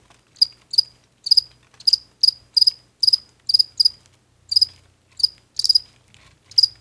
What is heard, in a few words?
bug cricket loop; ModernWarfare